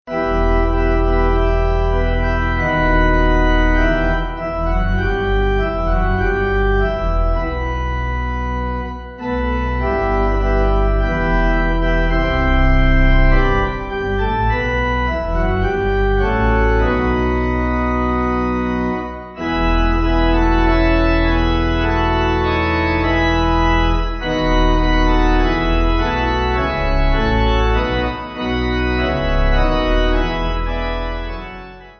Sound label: Pipe